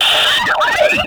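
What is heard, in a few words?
Military Backpack Shutdown; Objects; Storage Container; Warframe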